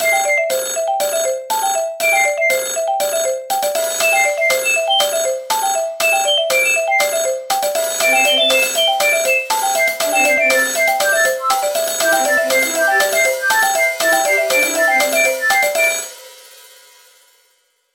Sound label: Clock alert 2